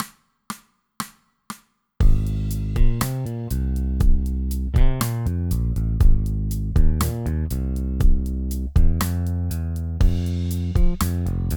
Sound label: Sweet chords Jam track